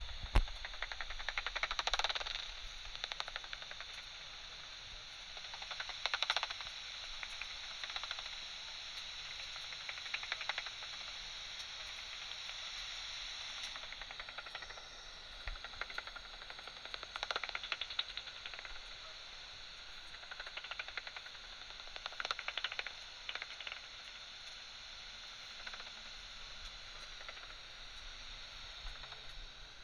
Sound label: bats